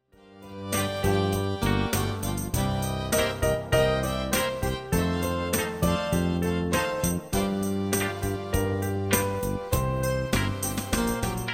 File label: mexican blues